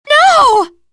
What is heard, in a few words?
wind lose